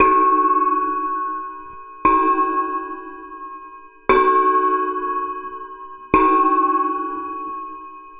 Shunt, Ambient Metals; Sonic Foundry (Sony Creative Software) - Ambient Atmospheres & Rhythms

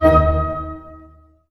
STAC D# PP, orch stac; Sound On Sound - Peter Siedleczek's Orchestra

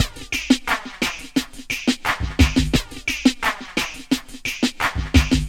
LOOP, Drum Loops; Big Fish Audio; Prosonus 500 Drum Loops

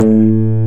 DIGITAL BASS, BassGuitar

